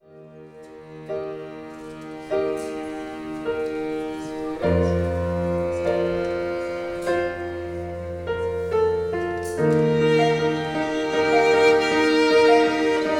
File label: Classic Ensemble; klassische Musik